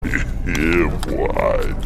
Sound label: deepest hehe